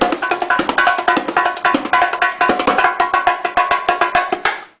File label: bongo